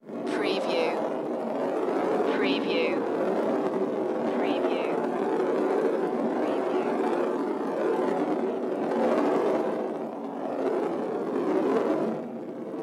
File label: marble rolling around; stone; the sound pack tree